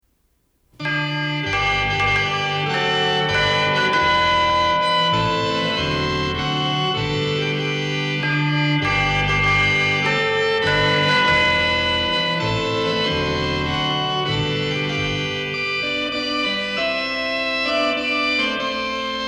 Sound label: Christmas Organ & Chimes O Christmas Tree